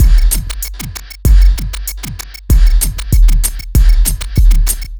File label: Beat, club; Sonic Foundry (Sony Creative Software); Club Joints and Street Anthems; Toxic Rain